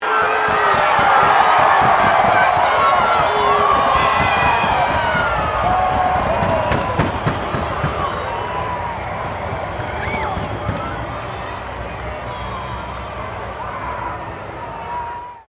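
crowd 15K Rugby World Cup cheer high, Rugby world cup game; Crowd Sound Effects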